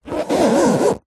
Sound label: foley leather case thick rub squeak